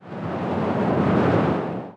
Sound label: wind